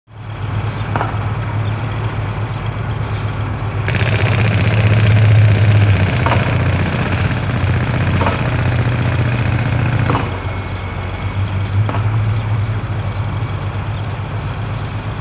Construction Site Atmosphere; Building Site Atmosphere. Banging And Pneumatic Drill.